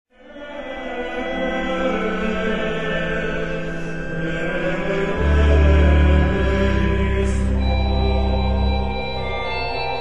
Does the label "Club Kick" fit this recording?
No